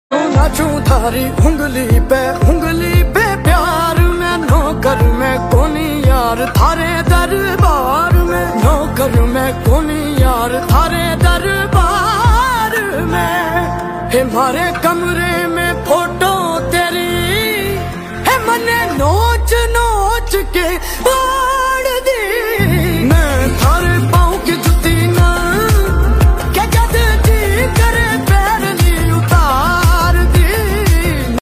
hindi lofi music song

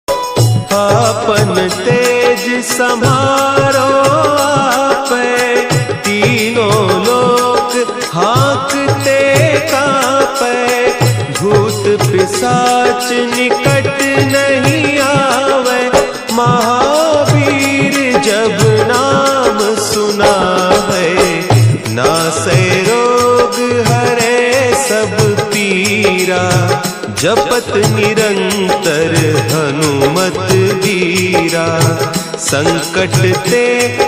Hanuman Chalisa ringtone

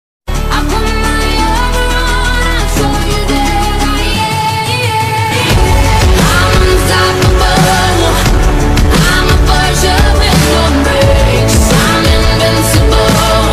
bgm ringtone

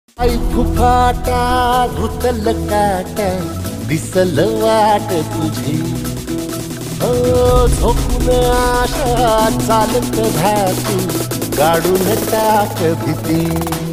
marathi ringtone

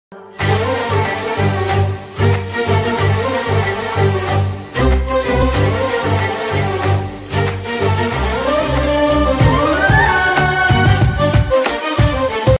ringtone song bgm

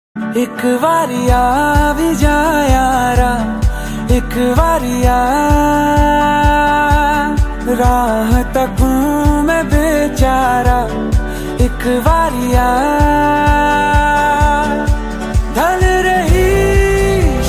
ringtone music beats

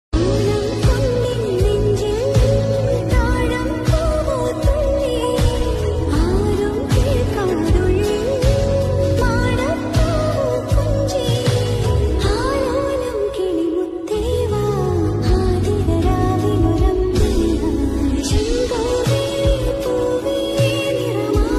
cover song malayalam

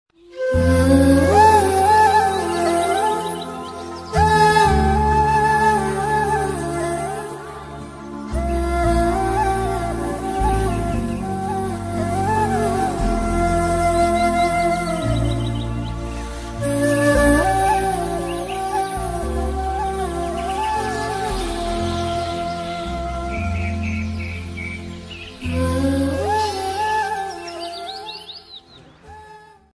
tamil love bgm; mudhalvan

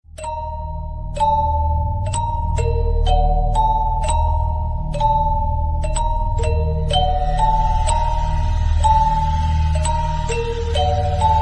ratsasan bgm

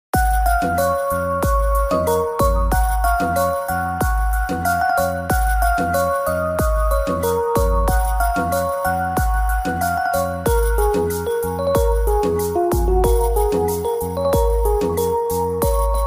piano flute ringtone, instrumental

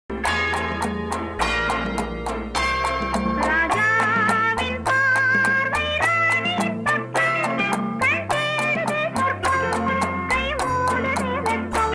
background song

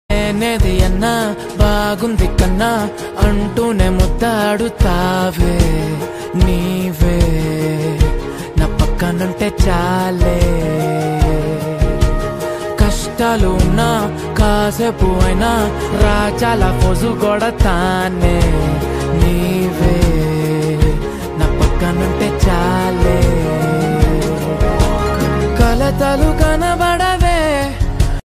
South Indian Love Ringtone

